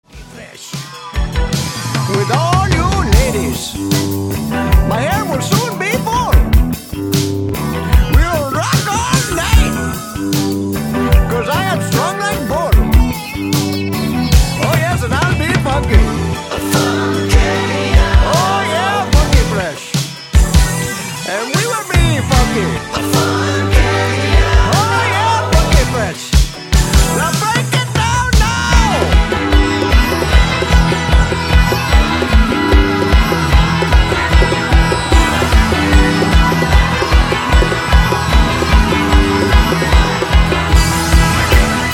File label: funky